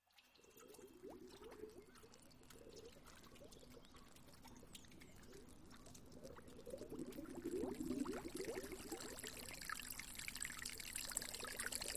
flowing water stereo and surround edition; boom library

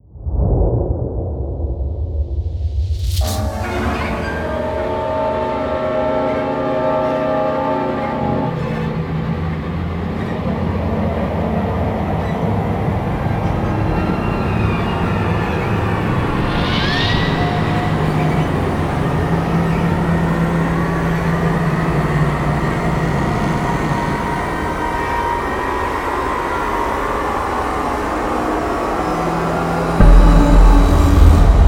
cinematic tension horror sound fx; cinetools

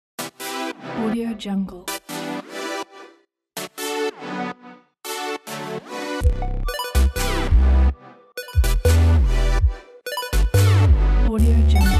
video games intro music

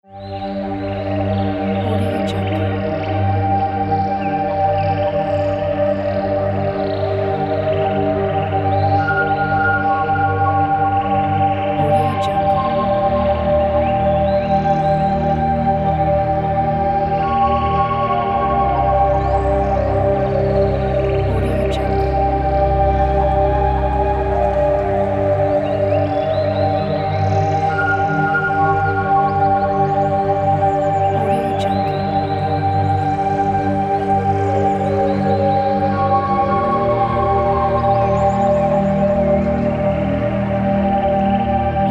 mystery in the forest music

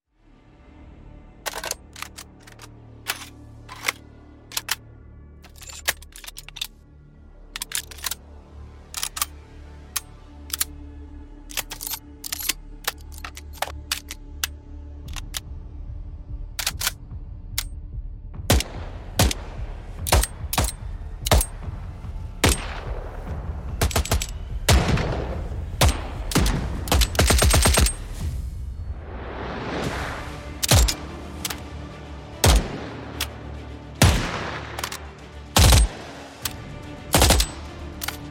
world war ii firearms designed sound kit; boom library

